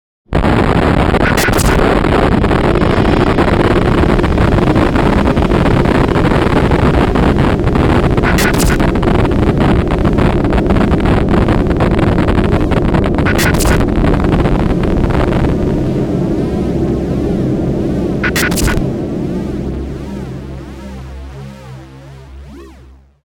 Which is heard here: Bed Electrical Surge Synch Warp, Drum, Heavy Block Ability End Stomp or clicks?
Bed Electrical Surge Synch Warp